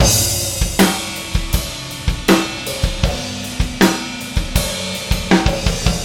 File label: loop